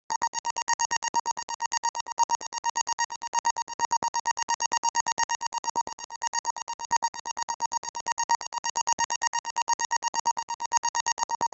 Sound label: electronic beep speech, beep